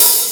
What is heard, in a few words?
KIT, Dance Mega Drums, HIP; Best Service